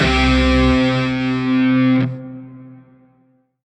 Shawzin Pent Maj Chord L; Music; Lotus Shawzin; Warframe

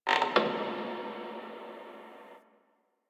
Wooden Creak Small

